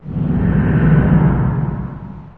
hole hit, ambient atmosphere; Left 4 Dead; Source Engine; video game

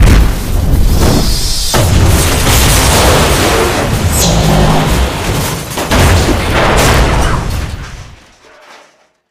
gas station explosion; Left 4 Dead 2; Source Engine

